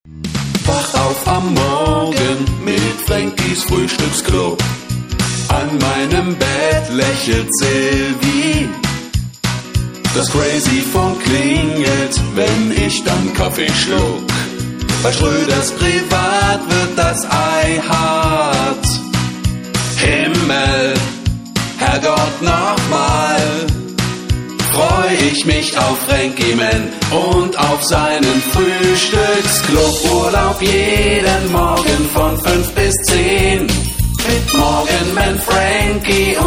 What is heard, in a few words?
sing along; ffn